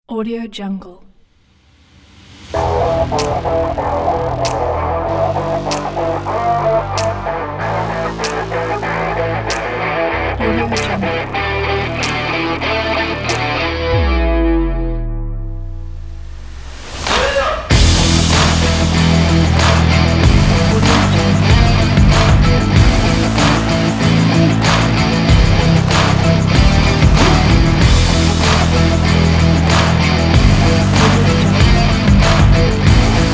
hard stilish rock epic; owlstockmusic

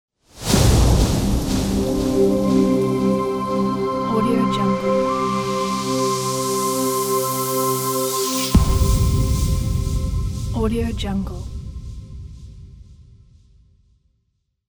brand logo

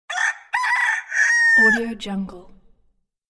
rooster

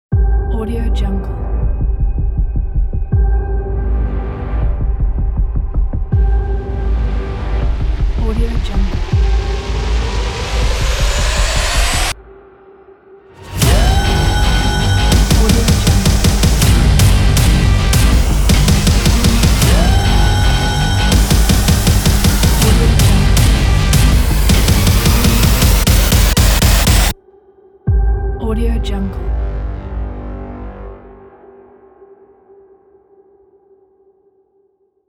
aggressive intense trailer

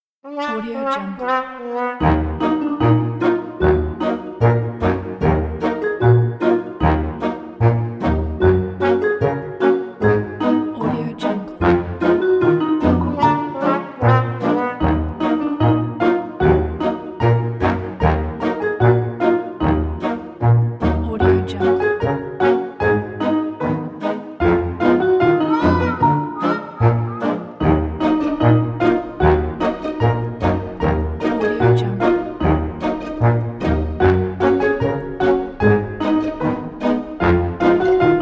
funny

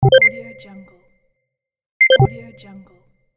on off

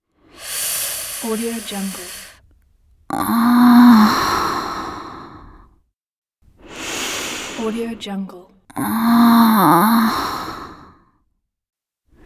breathing and moaning

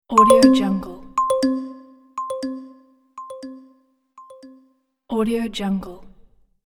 calm pleasant notification

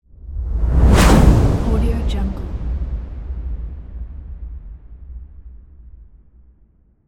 cinematic big arrow airy whoosh

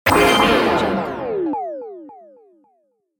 cartoon ui delete; jbobstudio

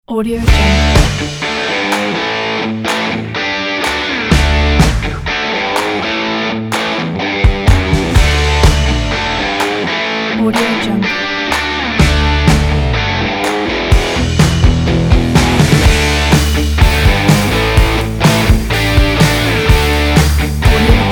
rock and roll

